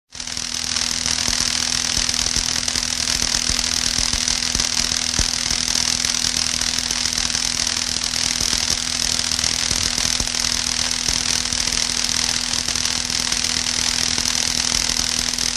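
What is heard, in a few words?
sound of electricity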